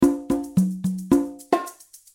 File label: conga bongos